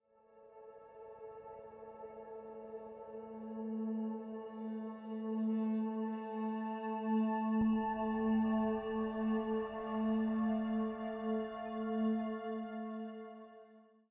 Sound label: 85bpm; Legends of Pegasus; windows gamerip 2012